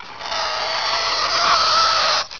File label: scratch; TomCat